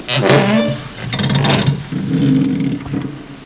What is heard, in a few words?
cattle; Little Red Riding Hood